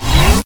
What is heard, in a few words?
Scifi Shield Form Powerup; Energy & Forcefields